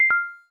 cam stop

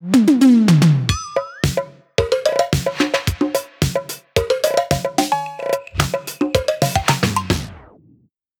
Tropical Beats; Google; Pixel-4; Retro Riffs

